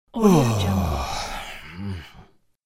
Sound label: tired male moan